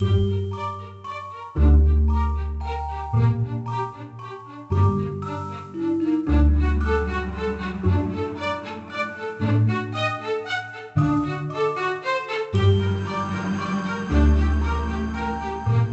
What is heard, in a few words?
Music Village; monster lab; nds